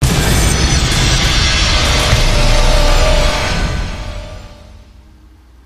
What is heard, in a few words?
Surprise; Blair Witch Volume III The Elly Kedward Tale